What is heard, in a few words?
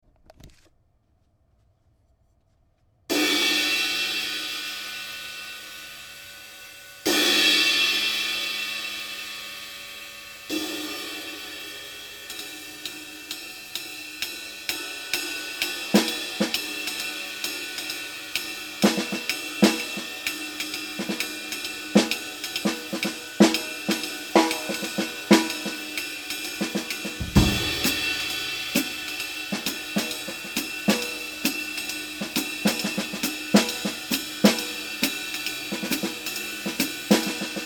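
20 hi-hat Sound Control; Sabian 20 80s HH SC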